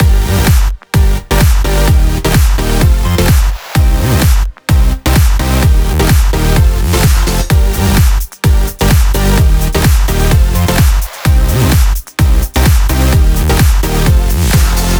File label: 128BPM Mix; Vengeance Samples; Celebration Kit